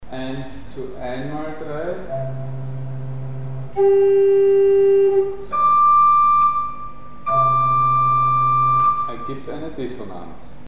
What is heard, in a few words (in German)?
Dissonanz durch Frequenzmultiplikation mit Primzahl 3 bei 3x3; Mikrobuch